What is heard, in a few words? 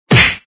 Good Punch; Dad 'n Me